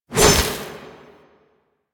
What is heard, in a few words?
Hover Whizzby; Enemies; Deco Hover Whizzby Envy; Warframe; Warframes; Pax Duviricus